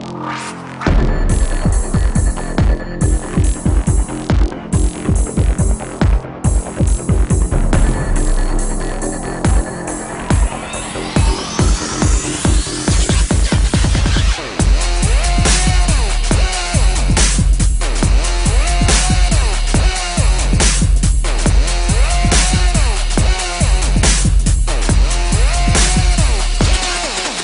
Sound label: Dnb Theme Song